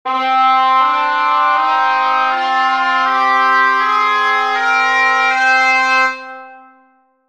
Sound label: mode minor